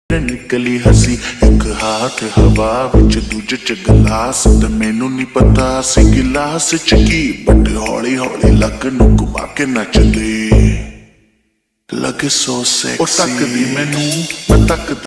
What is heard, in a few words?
slowed hindi rap ringtone